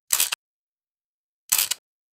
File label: camera click and photo ta, photography